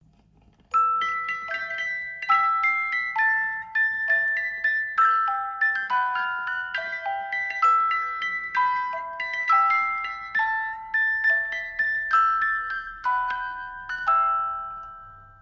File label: 18 lames, boite a musique; Boîte à musique en bois personnalisable.